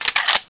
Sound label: clip in; tfa cso